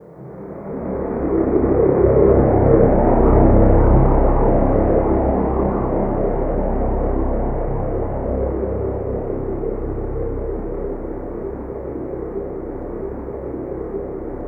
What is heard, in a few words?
ATMO, Special FX Twisted; USB Soundscan; AKAI